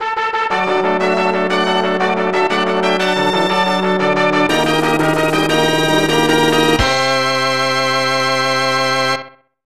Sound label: Race Start Fanfare